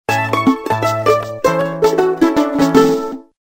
power on latin

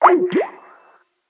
hangup; Netgear SPH200W Skype WiFi Phone

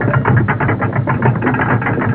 cannon rotate; Nokia; 5800 XpressMusic; Bounce Touch

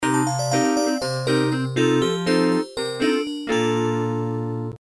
Fishing Start; LG GD900 Crystal; Game Sound

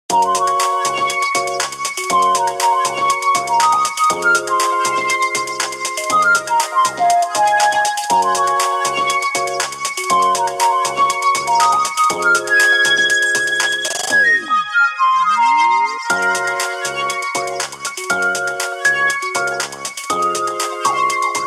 Travel Flute, ringtone

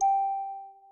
Class Electronic, notifications; OnePlus 12

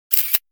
Shutter; Samsung GT-B9062

